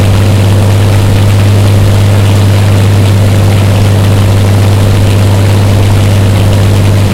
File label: me109 rear, engines; War Thunder